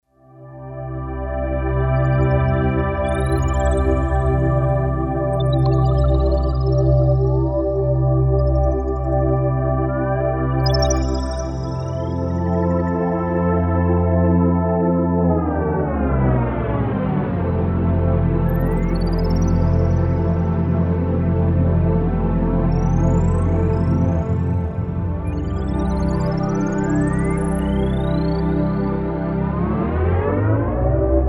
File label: Creaturz, Kawai K5000S factory presets